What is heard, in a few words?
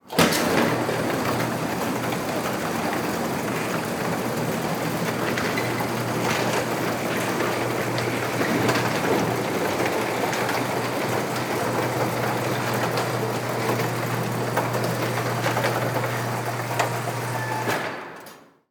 Semi Truck Garage; Large Vehicles; Blastwave